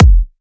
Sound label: Kick, Psytrance; One Shots